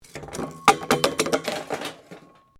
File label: PLASTIC PIPE FALL; Material components for constructing more complex sounds; Materials